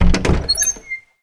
opening wooden door

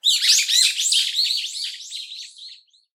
hypsipetes amaurotis cry

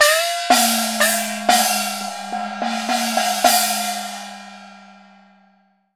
chinese effect

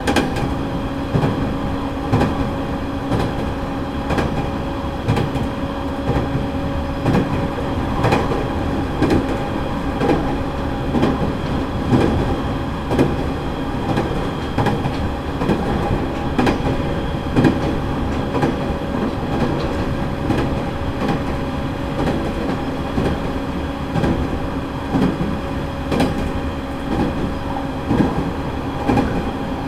train in motion inside train

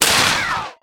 firing a hunting gun

